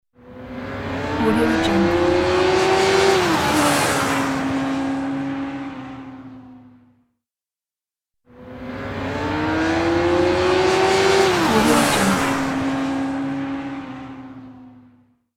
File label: race motorcycle passing by